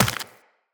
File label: Run Flesh, Footsteps; Tenno